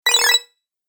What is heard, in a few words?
Game Collect Your Coins, Video Game Sound Effects